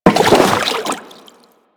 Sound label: Crewman Land Water, Footsteps; Warframe; Corpus